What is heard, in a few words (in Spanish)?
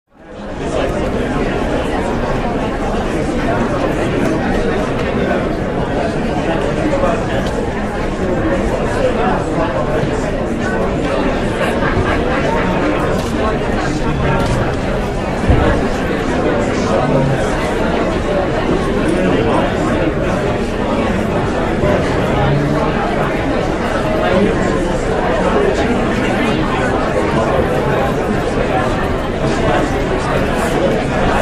MULTITUD